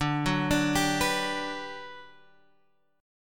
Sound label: G Major 7th, D